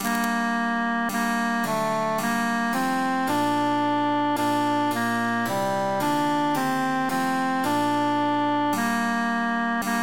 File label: tenor emphasized; belle qui